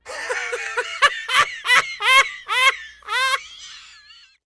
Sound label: laugh